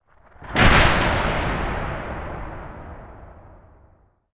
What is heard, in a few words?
thunder; War Thunder